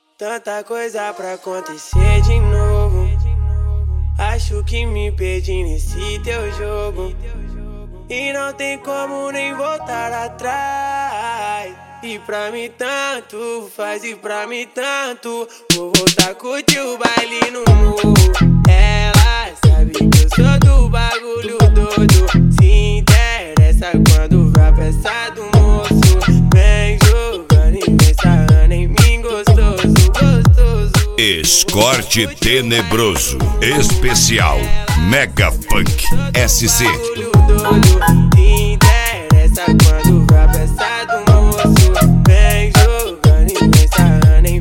mega funk

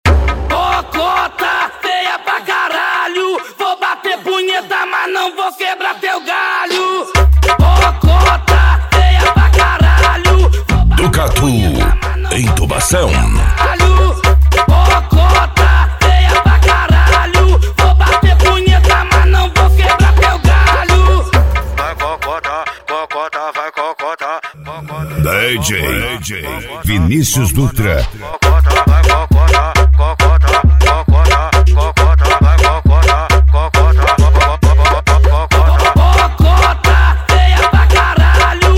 funk agressivo

